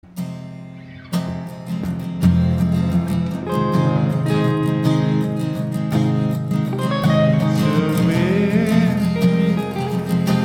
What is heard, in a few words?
acoustic expanding